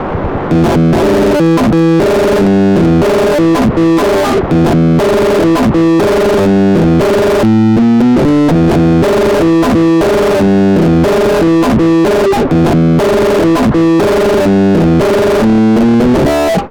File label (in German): MOD Devices MOD DUO X Epiano Alle Drei